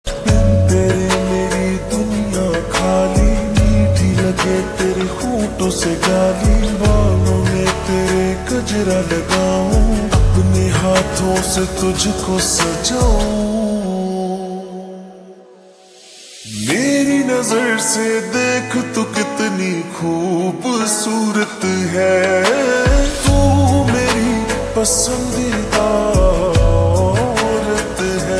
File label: Slowed Reverb